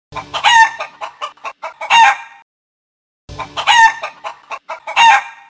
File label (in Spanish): sonido gallo